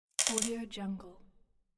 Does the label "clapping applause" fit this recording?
No